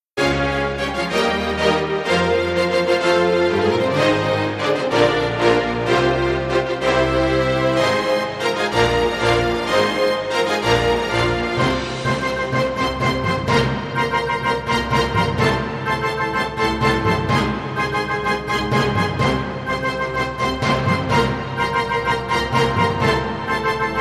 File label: Giant Footsteps